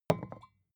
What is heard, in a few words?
vodka put down tipping hard